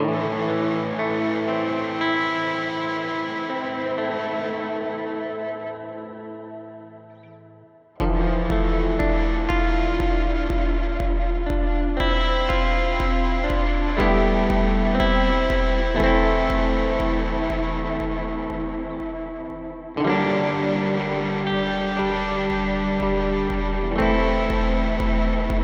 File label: Rainger FX Reverb X